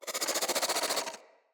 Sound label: Infested Crawler Attack, Enemies; Crawlers